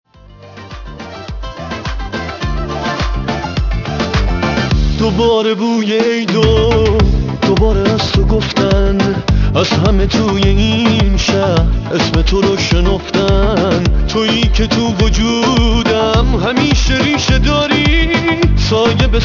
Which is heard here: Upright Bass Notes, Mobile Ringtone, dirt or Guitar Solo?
Mobile Ringtone